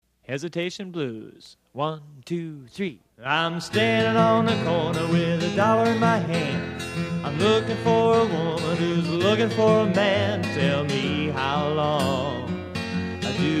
travis picking

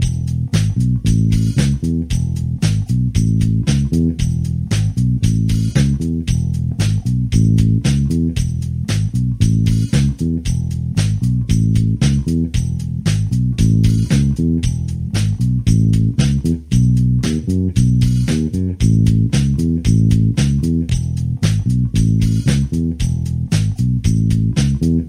bass lines

